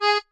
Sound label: marimba chainsaw; ゆめ2っき